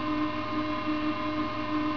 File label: Fuel Pump; Virtual Wings